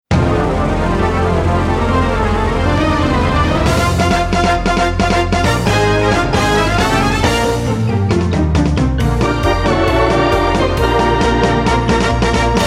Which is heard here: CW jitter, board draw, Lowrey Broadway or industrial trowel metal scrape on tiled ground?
Lowrey Broadway